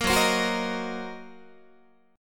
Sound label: F sharp 6th Flat 5th F sharp down Guitar; strummed; F#6b5; 4A,4E,5A,5D,5G#,6C#